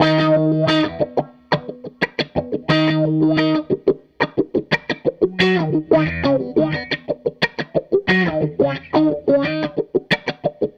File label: Strat Guitar Loop